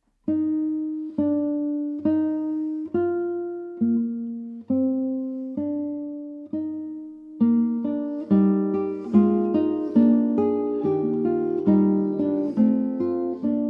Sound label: fuga in eflat; Prelude, Fuga, and Allegro in Eflat; BWV998